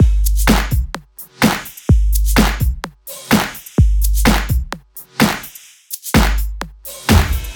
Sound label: 127BPM Kit; Vengeance Samples; Com Pop Kit